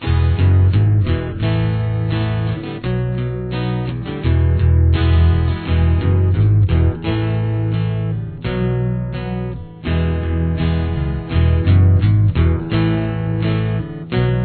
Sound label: chorus, guitar